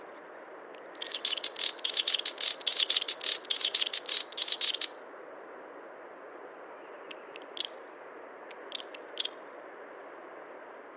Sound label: swhinhoes snipe crossing by; soundscapes